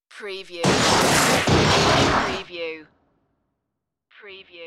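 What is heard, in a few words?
Loud Crash Two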